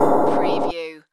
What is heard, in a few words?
digital data glitch